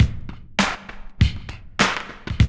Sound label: LOOP; E-Lab; X Static Goldmine 2